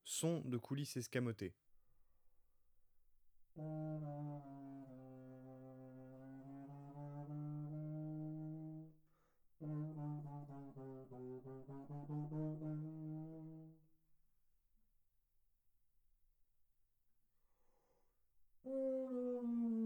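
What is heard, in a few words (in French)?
sons avec coulisse escamotée, trombone; Textures; Sons sourds; traite orchestration; trombone tenor trombone basse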